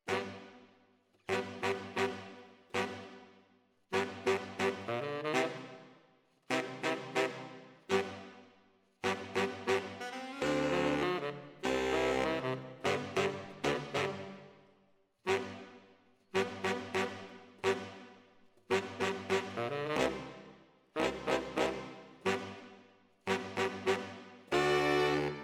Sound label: ORTF SCHOEPS, piano